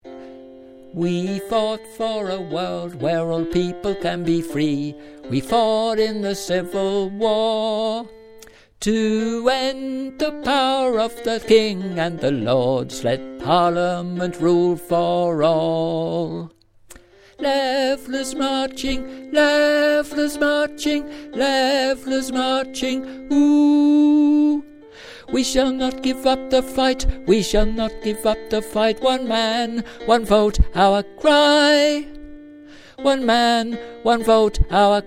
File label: Tenor